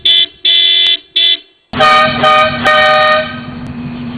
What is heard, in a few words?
Factory vs Airhorn; 4runner